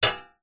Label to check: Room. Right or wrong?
Wrong